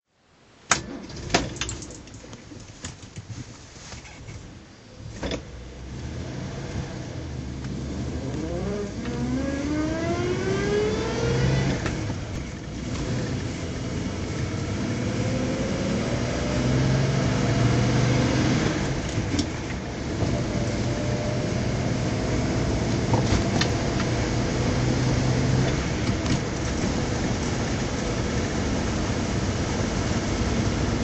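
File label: rover 95 p4 drive, engine sound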